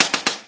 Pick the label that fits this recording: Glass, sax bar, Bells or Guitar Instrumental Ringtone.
Glass